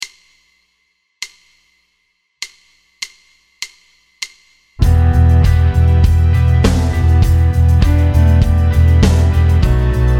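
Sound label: One Five One Em 100 guitar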